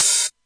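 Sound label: 707 open hi-hat; Hats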